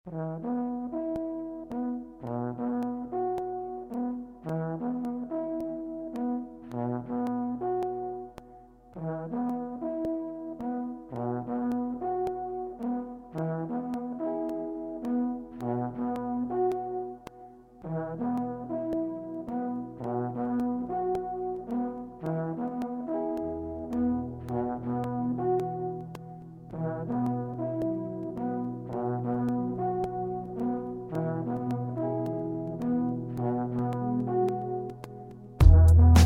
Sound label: new alphine horn